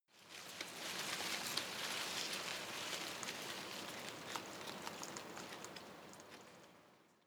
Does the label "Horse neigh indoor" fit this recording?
No